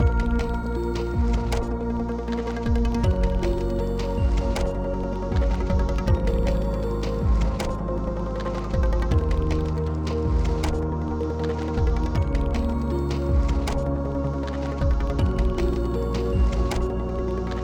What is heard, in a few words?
Exploration 8CH 79BPM